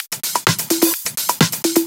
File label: Electro Loop 128 BPM; Vengeance Samples